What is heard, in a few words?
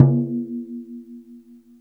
BODRAN, PERC; AKAI S-Series CD-ROM Sound Library VOL-2; BODRAN 1 SFT